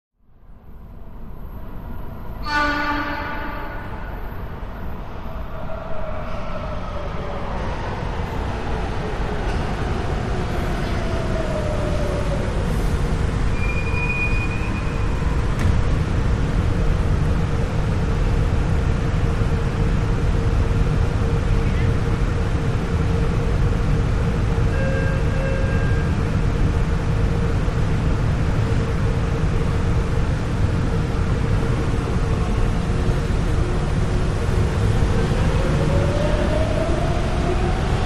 Subway Ambience Loud

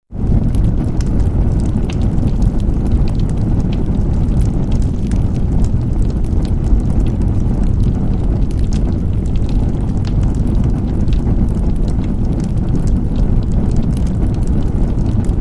Fireplace Fire Bass

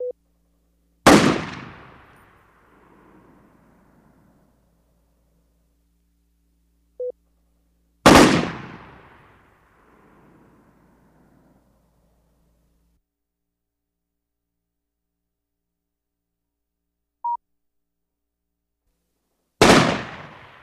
Gun Fire Variety 7 Sh, gunshots

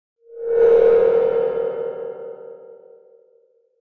Feedback Elect Tremo

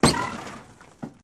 Auto Crash Lrg Head, car crashes

